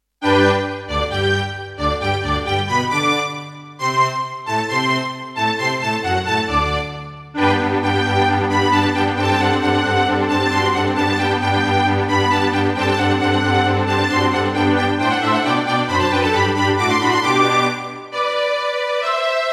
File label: Roland SC-88 Pro; classical; Mozart; Eine Kleine Nachtmusik partial